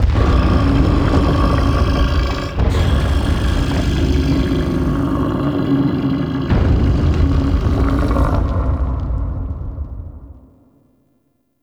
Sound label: Shaking Lab Rumble; Warframe; Entrati